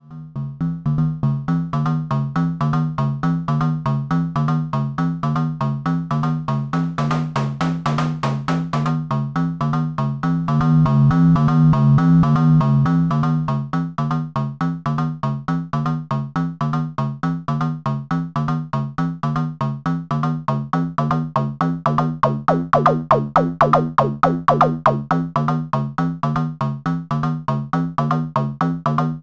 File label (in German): über 4 Arpeggio